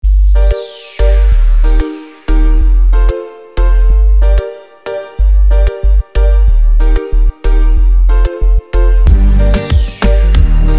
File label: (INSTRUMENTAL)